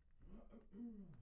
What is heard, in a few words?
Bass